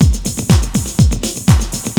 VC ADDED, breakbeats; Crypton - DrumBase5000; BREAKBEATS#4

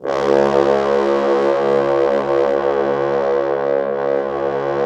C#1, Orchestral Brass

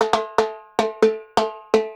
Percussion Loops, Brazilian; Houseworx

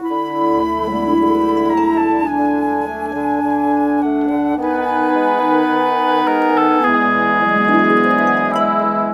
Brass Winds; Sonic Foundry (Sony Creative Software); Orchestral Series VOL-3 - Cinematic

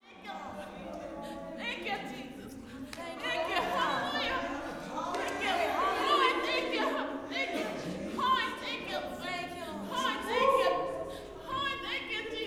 WORSHIP, WORSHIPAMB, Vocal Planet, Gospel

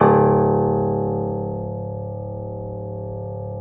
C#0 R, Keyboards Acoustic; USB Soundscan; AKAI

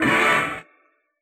SCR, Yamaha DJX, B900 Kit

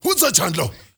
MALE RAV, MALE NOISE, Heart of Africa

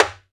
MUTED RIM, Timbale; Timbale Battery

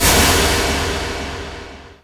Kick Rev; Quasimidi Rave-o-lution 309

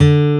Acoustic Gtr D2; Proteus 2000; Digital Sound Factory Proteus 2000

